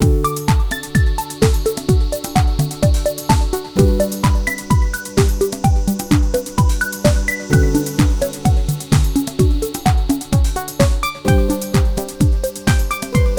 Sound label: Aerial Beat, Korg Radias